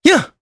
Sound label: Attack, jp; voices; heroes; Evan; kingsraid